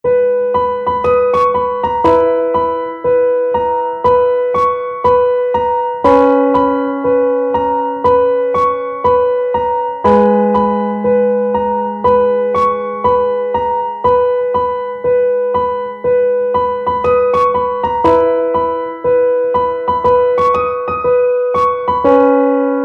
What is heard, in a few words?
instrumental ringtone